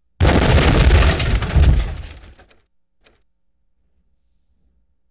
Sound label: Explosion, 5.1 Surround